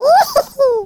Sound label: skelly small